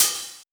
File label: Drums Hats